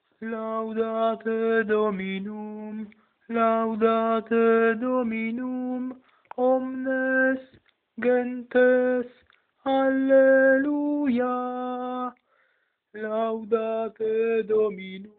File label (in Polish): Laudate Dominum sopran